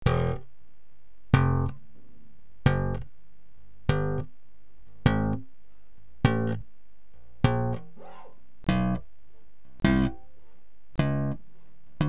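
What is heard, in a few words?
SLAP SHORT, BASS GUITAR SLAP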